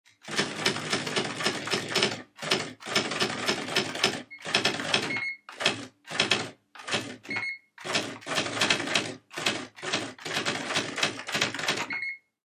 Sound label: IBM Selectric electric typewriter typing